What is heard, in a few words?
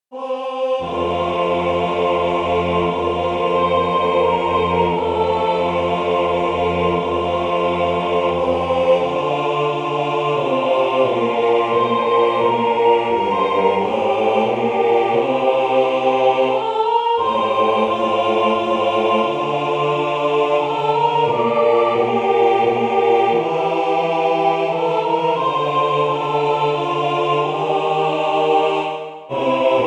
bass sopran; sleep well